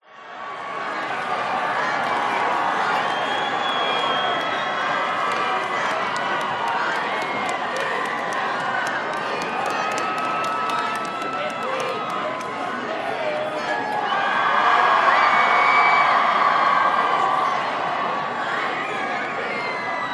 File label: american football crowd cheerleaders plane overhead